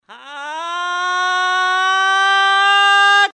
argh pain dead soldier; Sound Graph

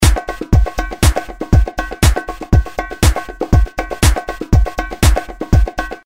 rhythm loop RassTech

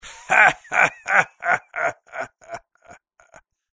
risa pirata prate laugh

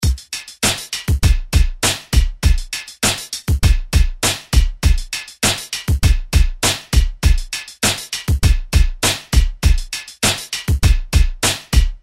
rhythm industrial; Sound Graph

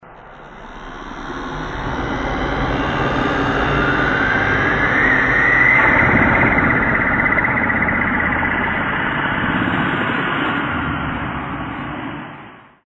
Spaceship dopler; Sound Graph

